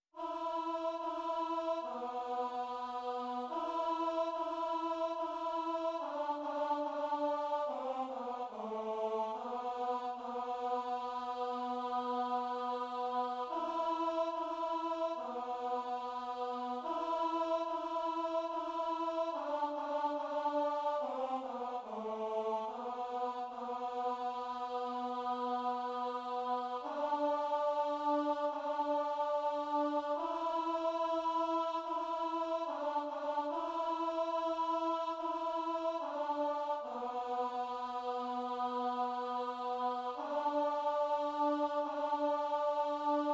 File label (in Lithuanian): ALTO; Viešpatie pasigailėk; Šv Augustino mišios